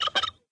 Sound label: Turkey Mom Scream